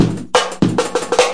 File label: 177 chiky flam, drum loop; 161 to 180 bpm